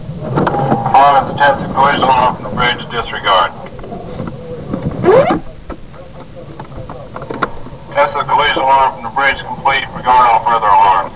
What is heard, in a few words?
collision test